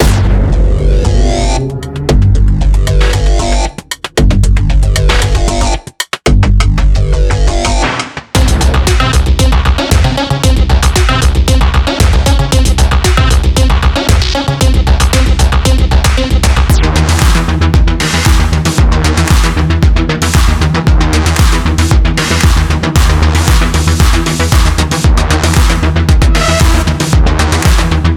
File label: Future Techno; Four4